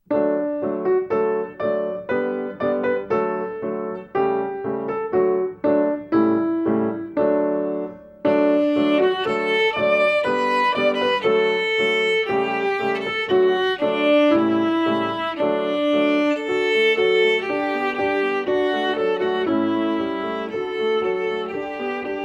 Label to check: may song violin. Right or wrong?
Right